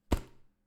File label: Clothes impakt; Gods of Akragas